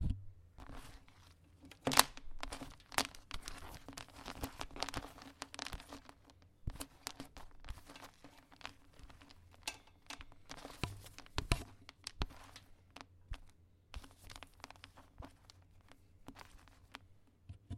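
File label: plastic cables moved over wooden floor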